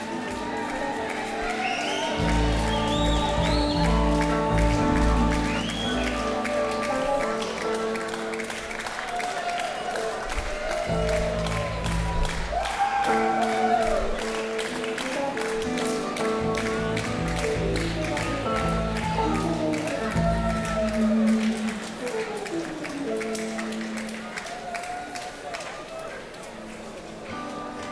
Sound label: Crowd